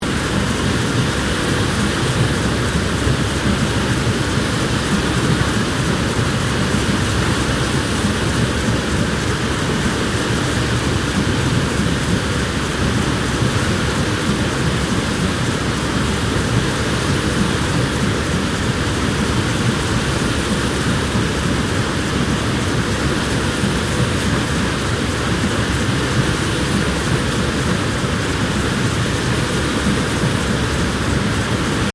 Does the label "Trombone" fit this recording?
No